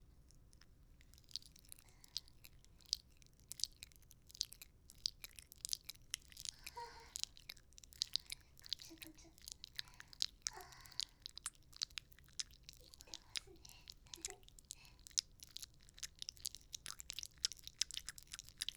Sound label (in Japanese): 耳元くちゅ音 ループ 両耳 バイノーラル